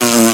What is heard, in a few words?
fart short fake